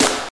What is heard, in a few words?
eighties rock snare drum sound f key, acoustic snare sound tuned to the F; snare-drum-samples